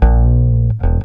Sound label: bass 3 (Bb) bend; Sonic Foundry (Sony Creative Software); Ugly Remnants VOL-2; Basstuff